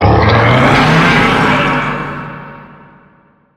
stampede cast; weapons; dota; centaur

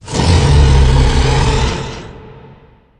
revenge roar; weapons; dota; creep

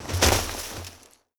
tag team target; tusk

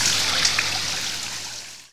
thunder clap target; weapons; dota; brewmaster

